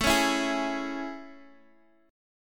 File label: A Major 7th, Guitar Standard; strummed